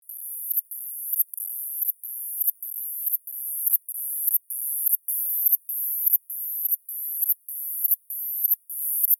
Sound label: cicada; Rimutaka; NZ species